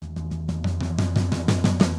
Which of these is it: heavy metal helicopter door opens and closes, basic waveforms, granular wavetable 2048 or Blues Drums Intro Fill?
Blues Drums Intro Fill